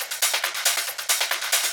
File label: Soothsayer Kit 138 BPM Loop, Drums & Perc; Bminor